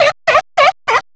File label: SEAL; M8; CMI Factory